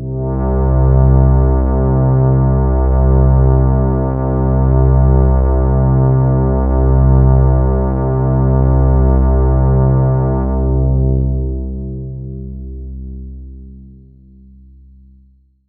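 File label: STR C2, Roland MKS-80 synth strings; Pads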